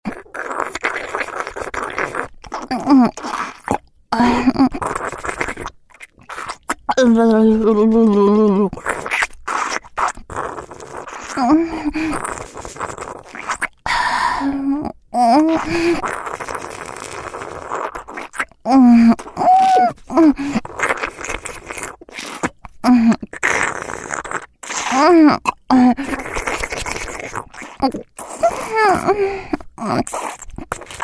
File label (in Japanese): 中 フェラ; アナル舐め手コキ2連射; ギャルフェラッ!!学校は援交ギャル風俗店ッ!!